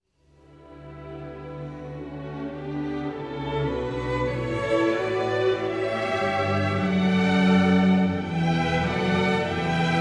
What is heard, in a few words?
Serenade for Strings; 'Elegie'